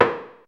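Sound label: white noise wood snare drum; AMG - Vince Clarke Lucky Bastard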